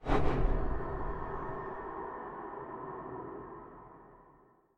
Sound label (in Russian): zhutki vush